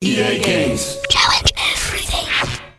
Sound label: start sound; ea games; ea challenge everything